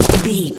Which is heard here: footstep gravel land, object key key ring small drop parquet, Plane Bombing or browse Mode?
footstep gravel land